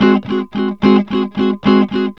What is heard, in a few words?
ROCK GS 110, Vintage Blues Guitar; USB Soundscan vol.22; AKAI